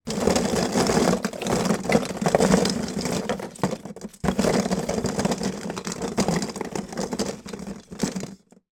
foley box of christmas decorations rummage movement; zapsplat